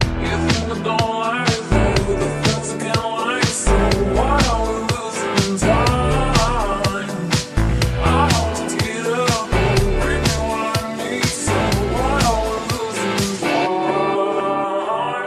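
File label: wedding sax, Solo Saxophonist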